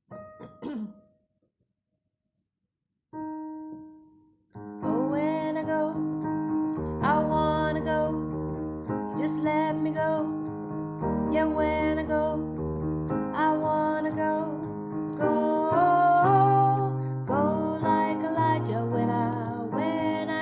Go like Elijah hoge S1 zang

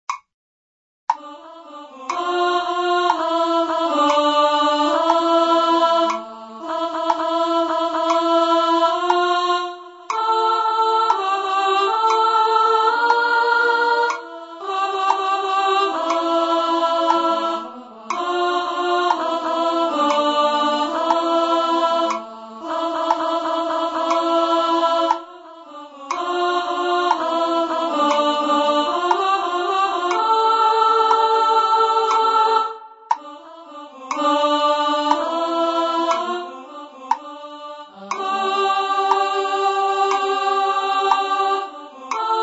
sopraan

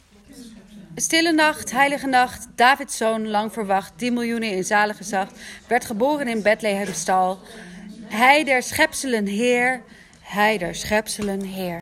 Stille nacht tekst

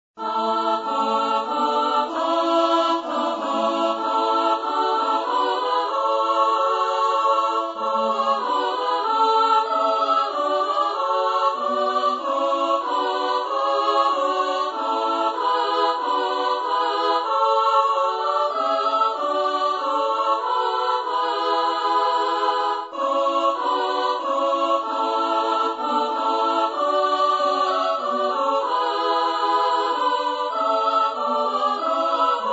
Hoe Leit Dit Kindeken SSA

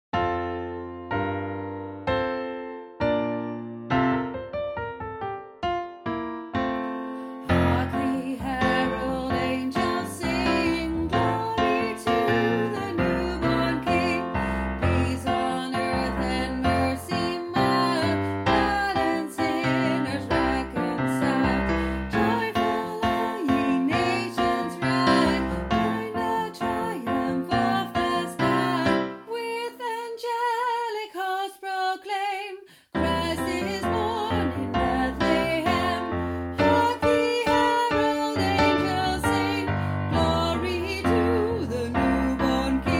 sopraan; Hark-2e-sopraan

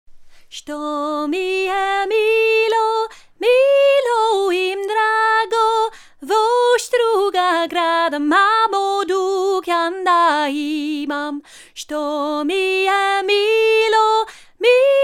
sto mi e milo hoog

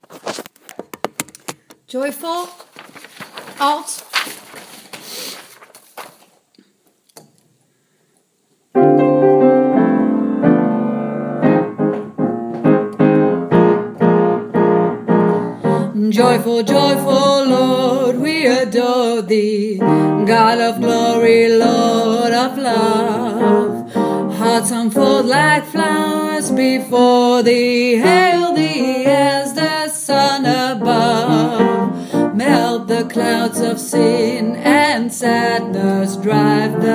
Joyful Alten

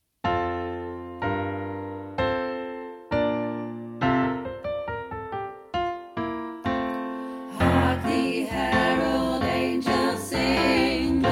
Hark 3 stemmig

